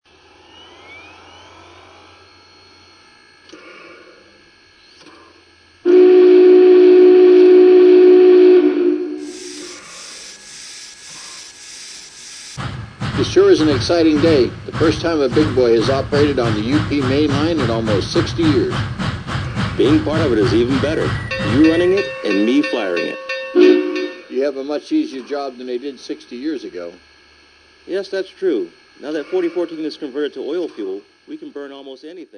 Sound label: Big Boy 4014, trains